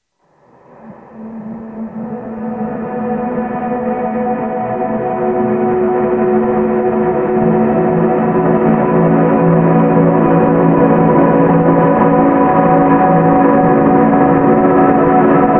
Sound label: seagulls